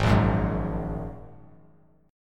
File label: Ab 7th Suspended 2nd Ab; Ab7sus2 strummed